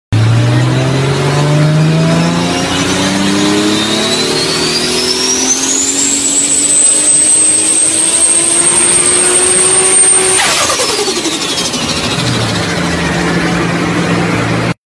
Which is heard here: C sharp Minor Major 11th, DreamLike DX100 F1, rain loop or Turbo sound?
Turbo sound